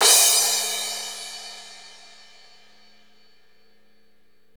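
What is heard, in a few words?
cymbal crash, Roland; Northstar; Drumscapes Roland; Cymbals 2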